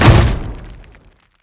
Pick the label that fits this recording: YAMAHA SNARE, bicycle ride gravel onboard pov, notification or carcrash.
carcrash